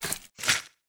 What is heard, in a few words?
thunder